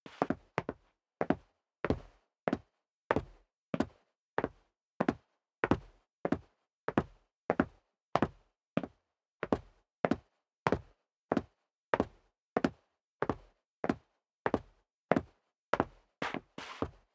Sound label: step sneaker concrete downstairs sequence; schritte